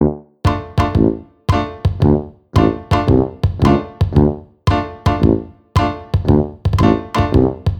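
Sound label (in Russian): смешная мелодия для анимации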